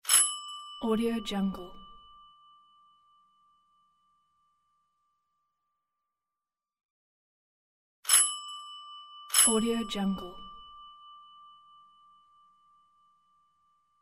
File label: vintage door bell; noon-music